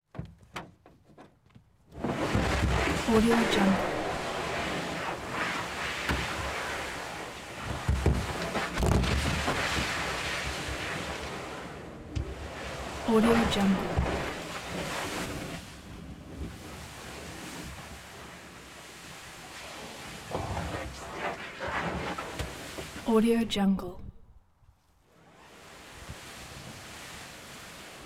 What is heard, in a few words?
onboard canoe movement in grass; collanderson